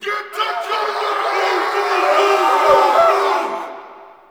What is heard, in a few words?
Battle Cover Stinger; Makvoss Cannon